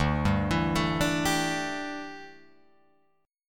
D Suspended 2nd Suspended 4th, Guitar, Open F, chord; arpeggiated